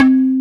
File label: THUMB piano C3; AKAI S6000 CD-ROM - Volume 1; MALLETS